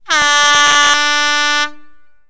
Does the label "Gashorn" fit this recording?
Yes